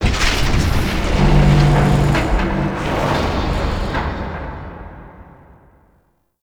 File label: Void Dragon Move Long; Warframe; Duviri; Movement